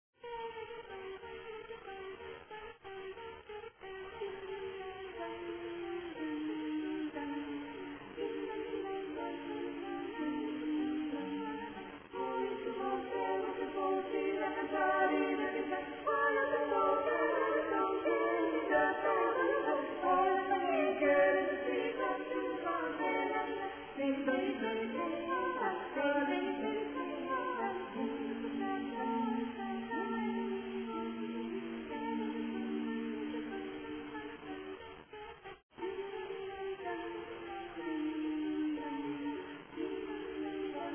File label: carol bells